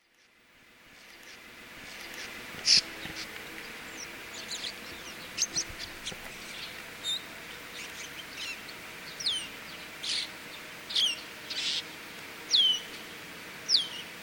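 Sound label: Asian Glossy Starling song; ishigaki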